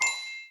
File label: spin